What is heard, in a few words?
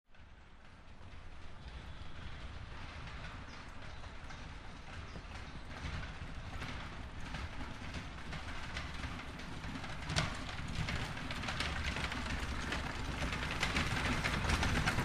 Carriage By Fast Stop